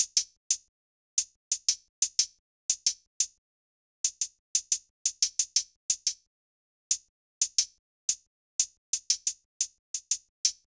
hat Dmn 178bpm

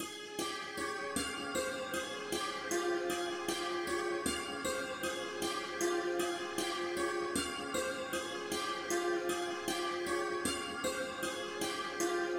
Ethnic Loop Santur 155 G min; Ethnic Loop Vibes

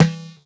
Dirty Percussion

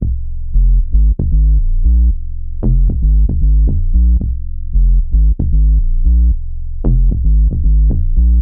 114 bass loop super sub groover F min

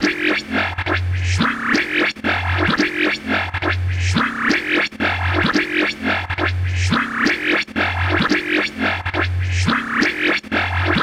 174 F Synth

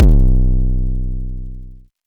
hitech kick phat distorted C

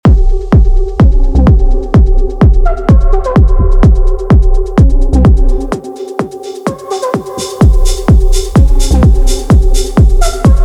Dark Techno; Dark Techno Factory

